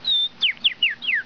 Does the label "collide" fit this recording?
Yes